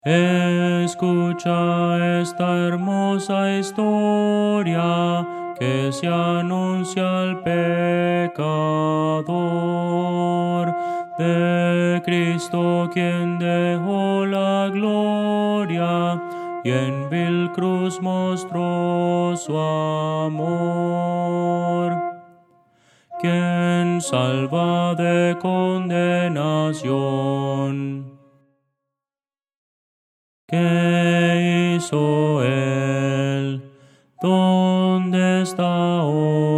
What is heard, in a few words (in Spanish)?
contralto